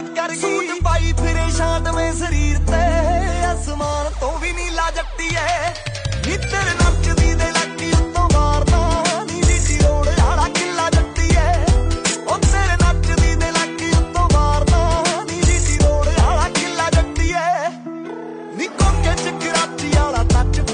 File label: Ringtone